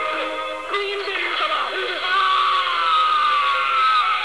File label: scream